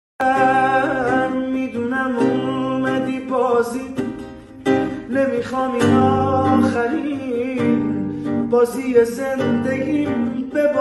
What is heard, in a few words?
Guitar; با گیتار